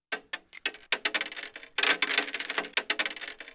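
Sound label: mg-42 shell casing wood; WAR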